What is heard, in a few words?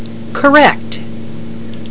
correct